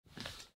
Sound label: from Bed